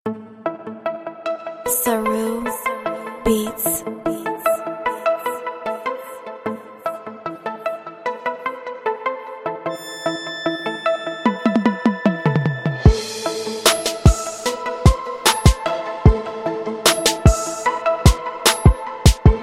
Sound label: Nice hiphop tune